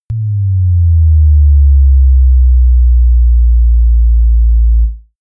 bass drop soft dirty

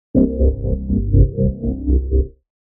short dark pulse

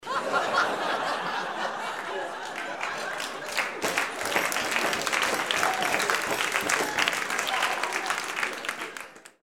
laugh applause

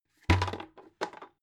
bucket rolling drop

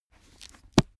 heavy book shut

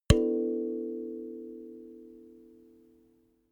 notification kalimba body hit

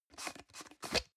plastic can small lid screw open hard

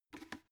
bucket empty handle grab

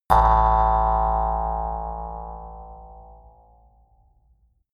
mouth harp

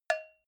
cowbell hit muted soft

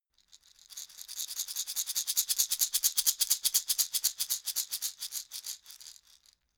shaker wood rattle dynamic

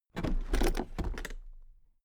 toolbox open regular

